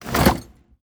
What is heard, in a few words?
Impacts Source - Metal Tool Drawer Punch; SoundMorph; Robotic Lifeforms 2